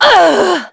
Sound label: Vox Damage; Lucikiel; kingsraid; voices heroes en